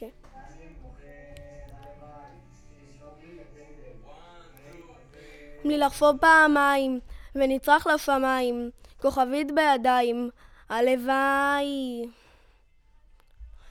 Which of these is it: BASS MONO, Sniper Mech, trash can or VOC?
VOC